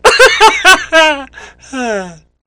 cheer

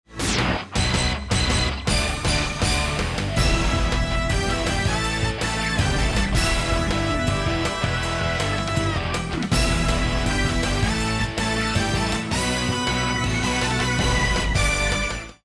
start action; music kits custom

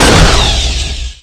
Stinger Stop, weapons; Requests Studio; UT3

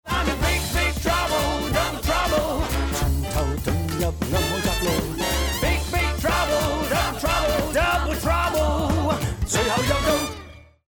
bomb ten sec count

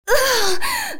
death

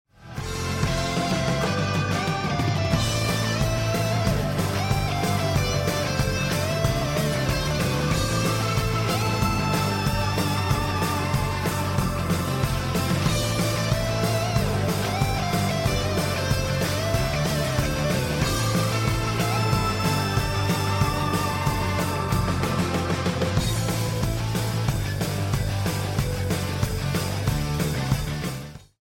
bomb planted; music kits custom

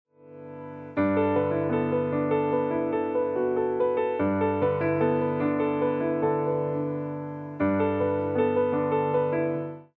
round ten sec count; music kits custom

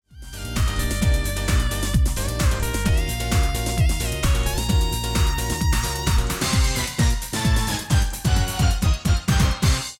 round ten sec count; music kits custom

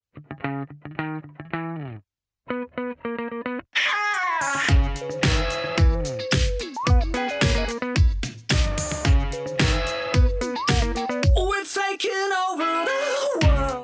lost round; music kits custom

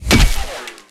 Flak Cannon Insert; weapons; Requests Studio; UT3

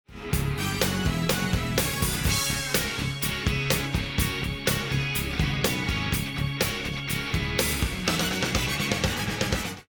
round ten sec count; music kits custom

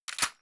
m4a1 bolt back, weapons; rif m4a1

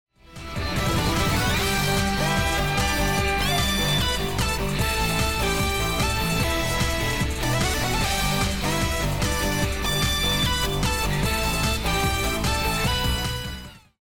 won round; music kits custom

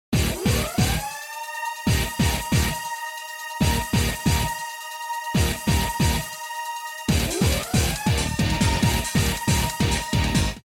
bomb ten sec count; music kits custom

